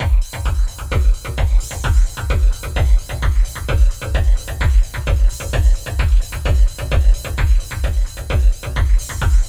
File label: BD HT, DRUM LOOPS; Zero G; Malice In Wonderland